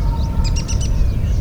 High pitched hee hee hee call notes of a Grey breasted Prinia, Maharahtra; Shivam Chitnis and AK